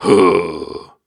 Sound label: Grunts and Chants, Viking Vocal